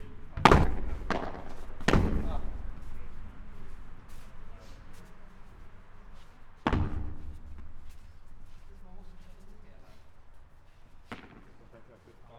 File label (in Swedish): Padel galler plexiglastraff; Kandidatgruppen